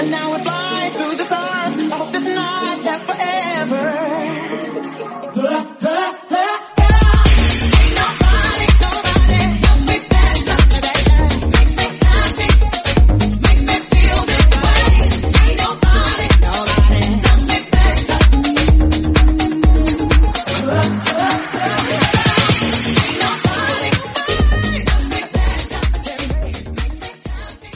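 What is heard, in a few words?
126 bpm E flat minor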